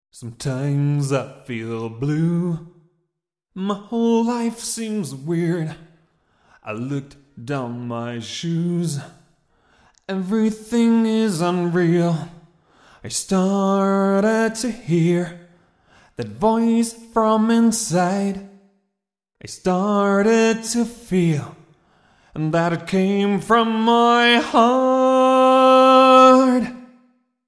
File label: Vocals Chorus Vocal Room